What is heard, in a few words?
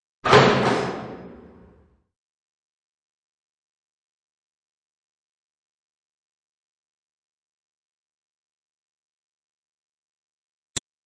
Track, Doors; 헐리웃특수효과음; 문 소리